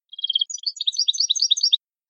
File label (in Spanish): gorrion; campero